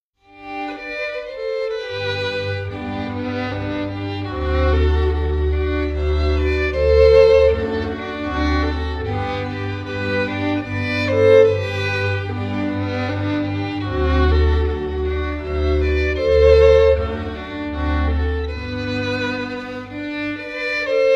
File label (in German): Bayerische Oberlaender Taenze